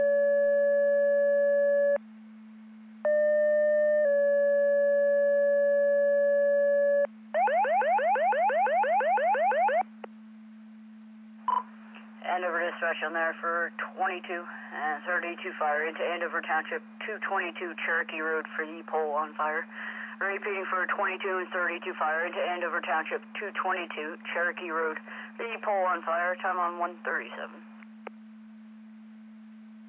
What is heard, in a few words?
Siren; Andover Twp Boro